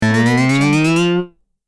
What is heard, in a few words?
cartoon accend steps sneaky; cinematic-designs